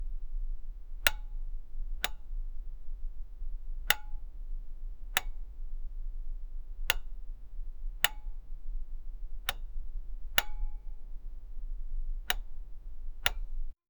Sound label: a button gets on a, lighting